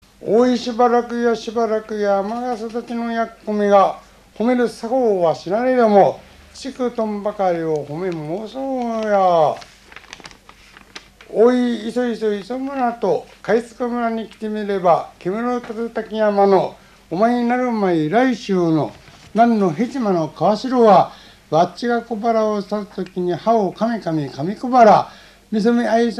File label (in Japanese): oiwaihomekotoba, minyou; 鴨川市; お祝い誉め言葉